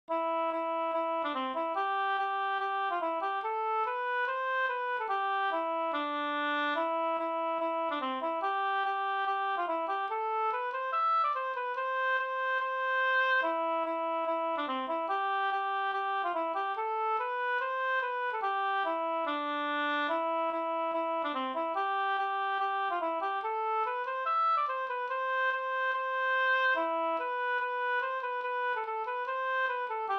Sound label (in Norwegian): reinlender